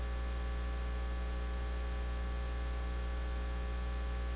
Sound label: cry